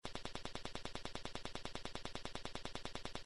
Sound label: punch combo